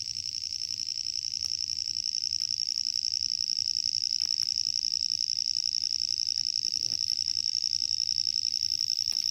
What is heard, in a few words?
Chiasmocleis hudsoni